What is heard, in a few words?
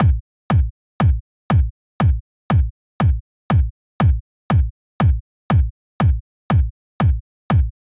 Kick, 120bpm D; Zero-G; Nu Disco; Happy People